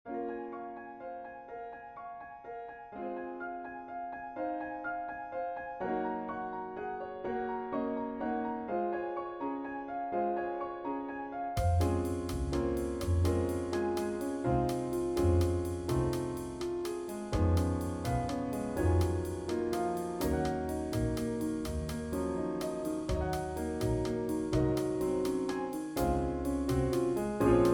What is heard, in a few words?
Jazz Trio; Beethoven but Its a Jazz Trio